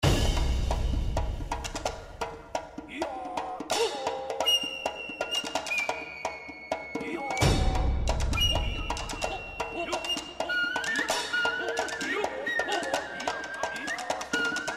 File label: BGM Bonus Credit, SLOTS; Kungfu Showdown